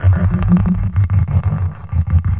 Rumbling Chant, Vocal; Sonic Foundry (Sony Creative Software); Myths of Technology

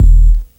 UGLY BOOM, Hip Hop; E-MU Formula 4000 Series Vol. 1; Hip Hop Nation

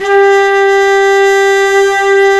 mute flute, hybrid winds

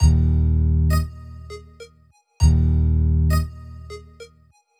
SYNT, funk; USB Soundscan vol.47; AKAI; Hip-Hop & R&B

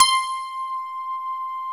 PF C 5 -L, GRAND PF; AKAI S-Series CD-ROM Sound Library VOL-7; D.GRAND PF

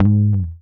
G#1 GTR TRIK; Univers Sons; Jazzistic

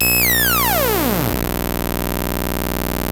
ARP 2600 B1, synthesizer; Hollywood Edge - Giorgio Moroder Rare Synthesizer Collection

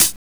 KOMMERZDRUMS

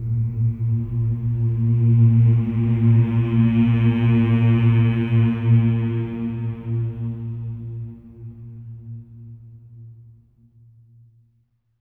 MM A#2; SWELL MMS

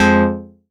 stab rave, stabs

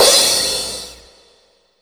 PROCYMB, CYMBALS

